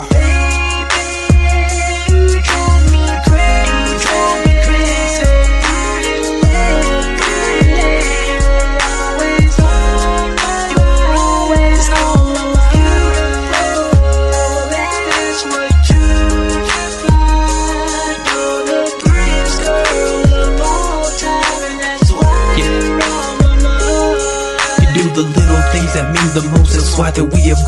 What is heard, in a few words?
baby boo funny ringtone